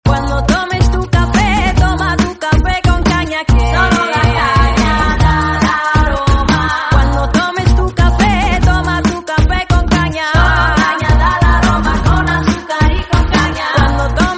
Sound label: noha tune